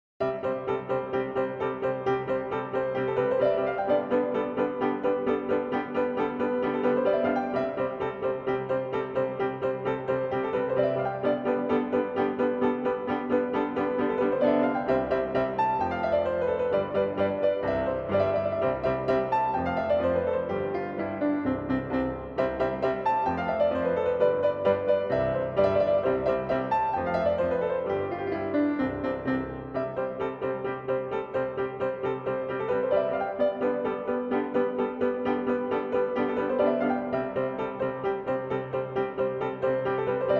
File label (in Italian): minuetto